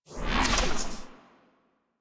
UI Intrinsics Swirl; DE; Warframe; Railjack Player Ship

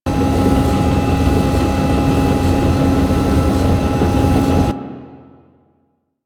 Galleon Machine Loop; Ambience; Props; Warframe; Grineer Galleon

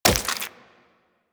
Wall Jump Flesh; Footsteps; Warframe; Tenno; Wall Run

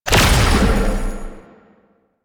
Shot Large; Weapons; Warframe; Duviri; Incarnon Adapters

